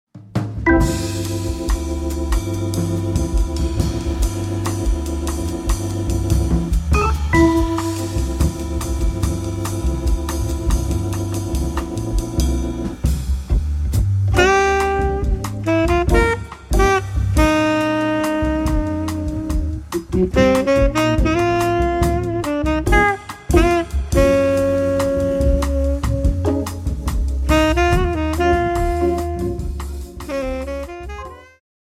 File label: drum